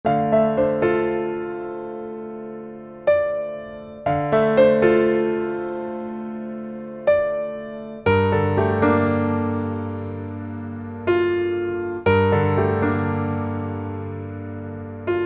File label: seduction piano